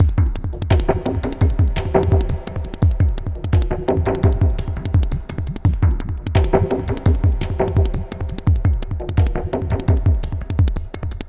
Terrestrial Hybrid, Processed Percussion; Sonic Foundry (Sony Creative Software); Rhythmicronics

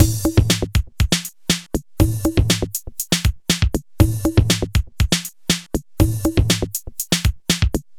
Bongo Beat; Sonic Foundry (Sony Creative Software); Myths of Technology; Composite Beats

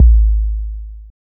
sub, sub bass; Techno ID; single-drums; subbassdrums

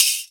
crash, drum machines

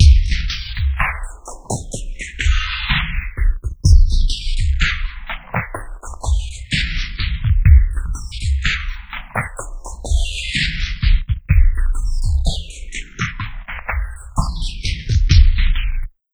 FILTERS, Dark Side Of TripHop

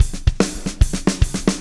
POWR, Backbeat, SHUF

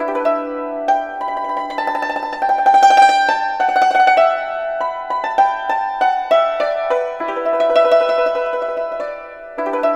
YNCHIN 100 G; INIS - Opium

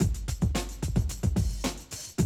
Classic DrumLoops, 110

